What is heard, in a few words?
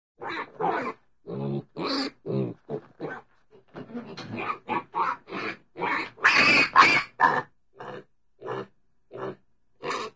pig grunts and squeals, animal; cartoon